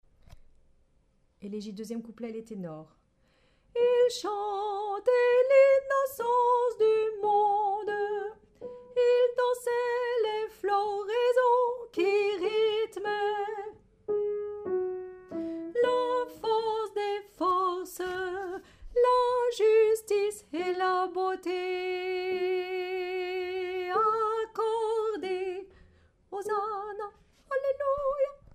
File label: Tenor